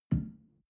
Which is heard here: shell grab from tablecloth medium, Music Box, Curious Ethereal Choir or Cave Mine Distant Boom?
shell grab from tablecloth medium